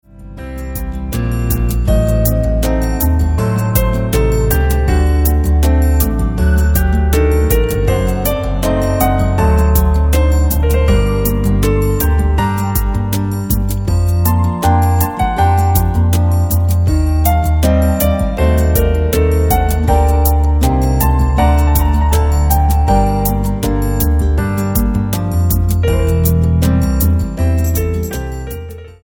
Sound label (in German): Sinfonia in F-Dur